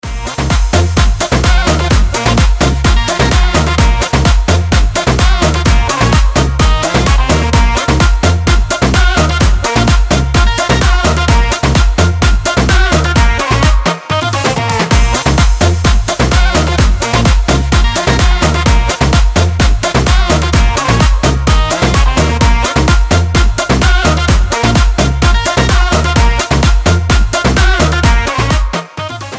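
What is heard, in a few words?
Croatian dance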